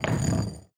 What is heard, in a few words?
puzzle slide